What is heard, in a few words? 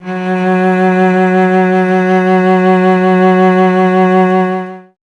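strings cello F#; Miroslav Vitous; Solo Instruments VOL-1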